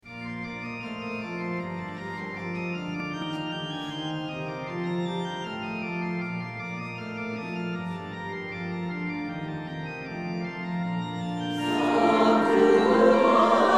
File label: CHANCEL CHOIR PERFORMANCE